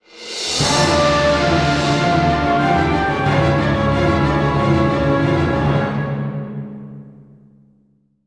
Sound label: Retreat Battle; heroes of might magic v gamerip 2006; Dungeon